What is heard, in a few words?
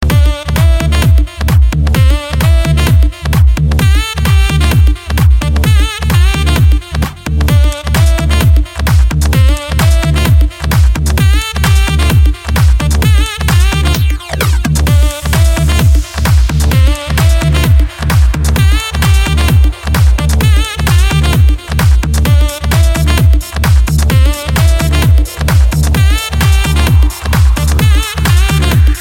Saxo Beat